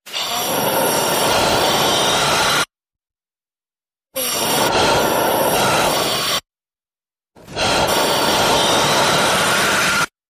Fear Demon Howls

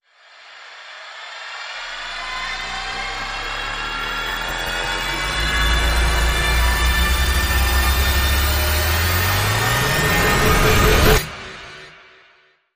Rise

